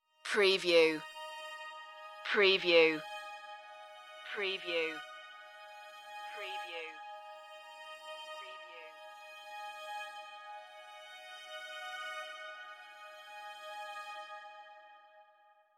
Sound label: dark wood strings